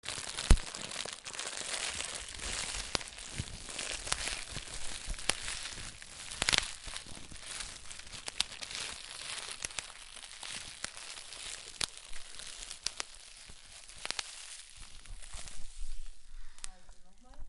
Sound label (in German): feuer